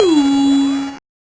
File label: Noise, 8-bit, Bitty Noises